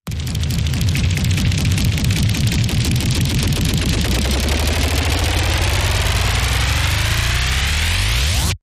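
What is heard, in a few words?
Rise Rhythmic Tractor Beam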